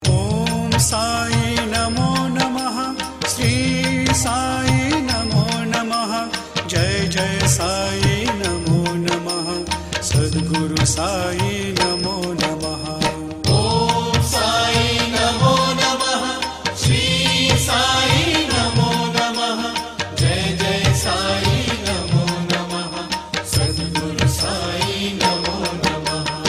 om sai chant

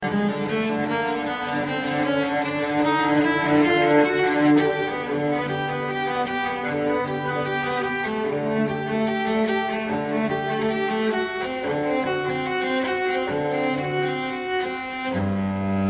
cello suite; bach

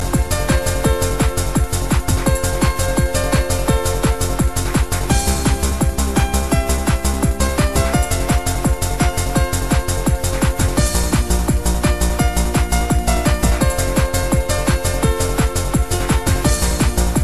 holiday techno mix

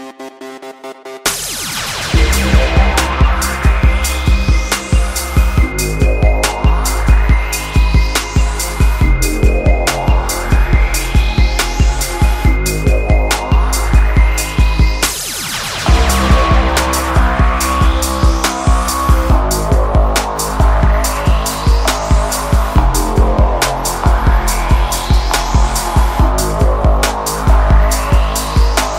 drum pad machine; ringtone

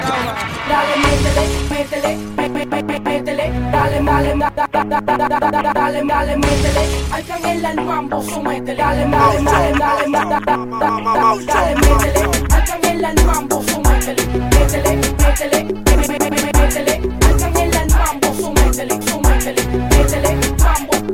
reggaeton mix

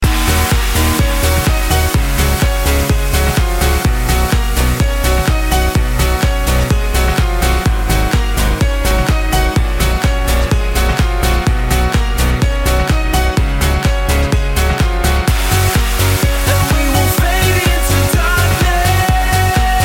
vocal mix

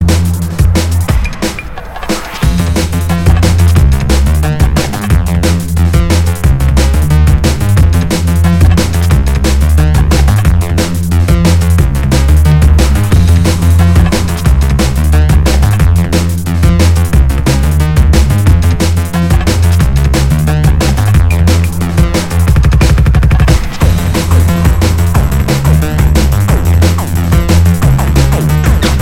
dnb music

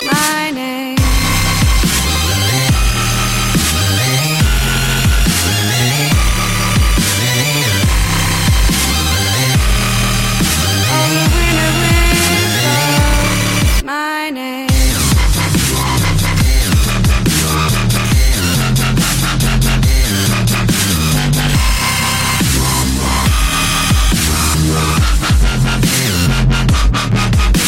dubstep

